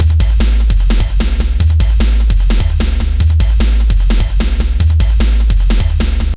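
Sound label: bass booster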